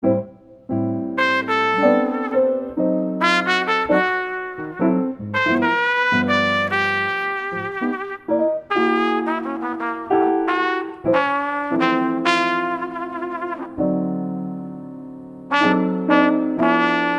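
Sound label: Utrennij dzhaz, morning jazz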